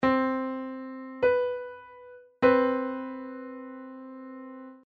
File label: major 7th, musical intervals